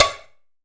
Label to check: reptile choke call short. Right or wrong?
Wrong